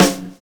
BIG ROLL; Univers Sons - Kitch 70s

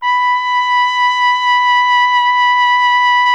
BRS TP B 0, Trumpet; Miroslav Vitous

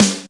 Roland R-8 Snare, Drums

